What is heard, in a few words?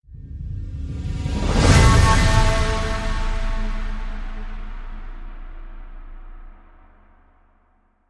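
Crescendo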